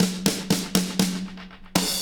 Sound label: Fills; Sound & Vision - Gigapack I